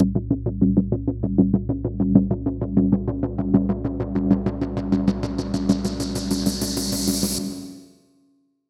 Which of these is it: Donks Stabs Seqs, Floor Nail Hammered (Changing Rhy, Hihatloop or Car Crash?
Donks Stabs Seqs